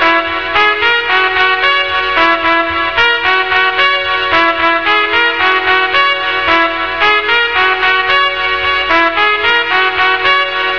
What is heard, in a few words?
trumpet; freebuild music